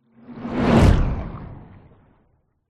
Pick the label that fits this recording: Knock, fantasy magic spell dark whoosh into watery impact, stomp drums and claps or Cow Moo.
fantasy magic spell dark whoosh into watery impact